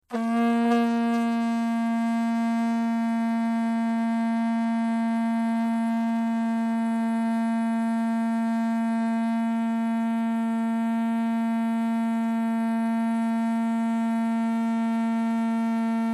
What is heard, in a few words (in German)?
Vuvuzela lang